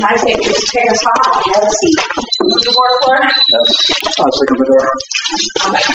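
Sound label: unknown squeak no response, EVP; Waverly Hills; Spirit Chasers of the Unknown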